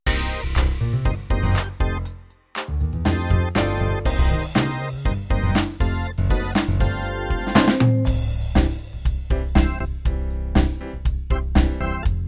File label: Gospel, rhythms; mzx500; preview pack 02